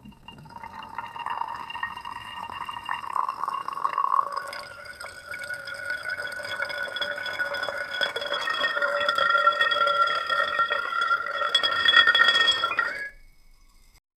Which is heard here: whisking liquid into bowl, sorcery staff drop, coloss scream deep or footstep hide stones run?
whisking liquid into bowl